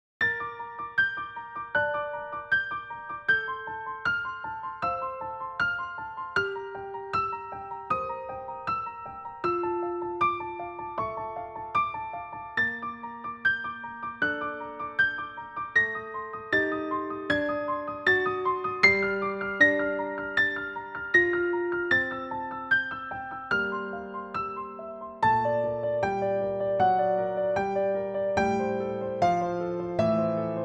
(Piano Arrange)

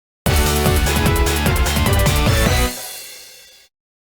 Summon Music; Signer Dragons; YuGiOh Over the Nexus

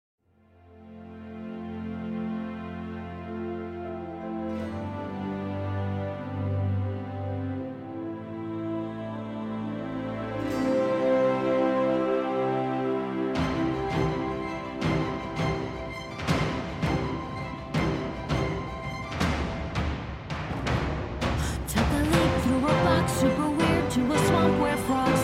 Epic Orchestral Cover

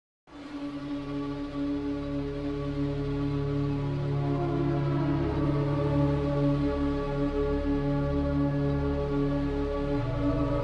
Epic Orchestral Cover

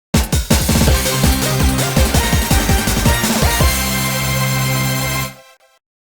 Victory; YuGiOh Over the Nexus

